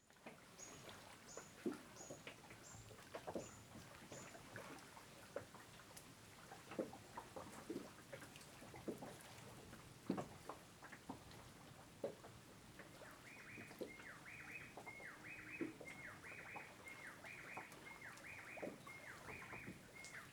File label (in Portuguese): Agua batendo em casco de lancha de madeira lancha parada beira de represa